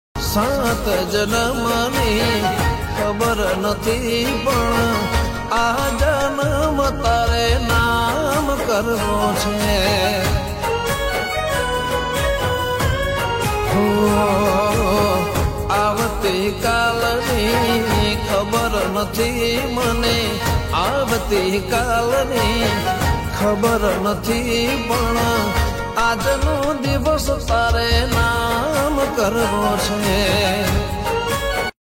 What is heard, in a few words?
Santhal Ringtone